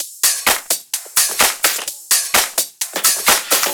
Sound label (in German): Drum Loop 128BPM FULL ohne BD